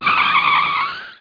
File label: BRAKE